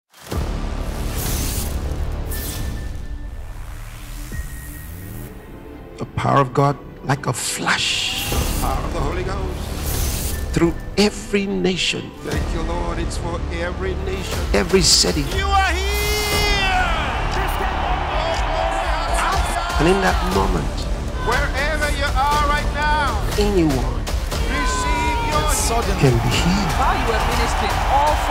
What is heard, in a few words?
JINGLE; HEALING STREAMS